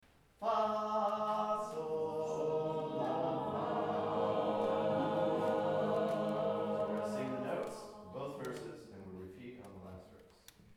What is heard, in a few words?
Columbiana Singing School; Poland Singing School 2011